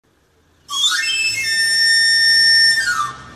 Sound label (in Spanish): el sonido del afilador